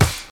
Bassy Steel Snare Drum Sample F# Key, acoustic snare tuned to the F# note